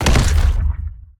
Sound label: Stealth Attack Land; Evolve; Behemoth